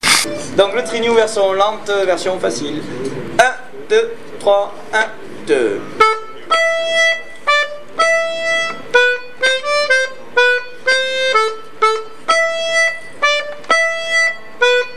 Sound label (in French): tempo lent; Trinhon de Bergon